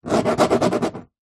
foley glass bobbled scratch fingernail; zapsplat

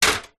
industrial step ladder metal set down on feet

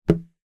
impact window double glazed object hit

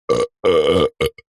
human male man burp stutter

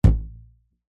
foley elastic fabric strip ping

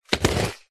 rollerblade single roll step; foley

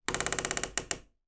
door wooden creak old loud fast; household; zapsplat

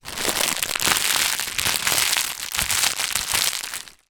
foley celephane wrapper thin movement; zapsplat

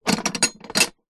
box container industrial metal small unlock latch

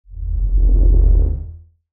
Surge; martyn stonehouse

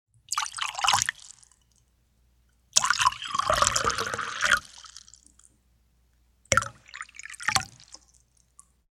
food drink tea pouring short sharp; sound effects one

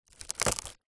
wood board large stress break splinter; foley

